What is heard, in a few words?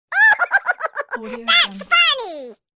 cartoon voice laugh thats funny